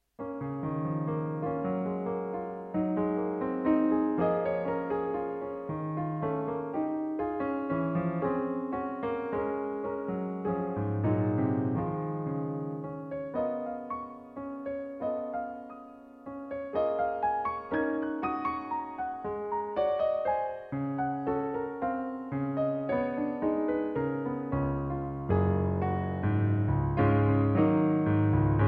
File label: mazurkas; scriabin